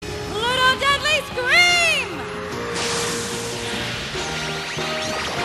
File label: Deadly Scream; Pluto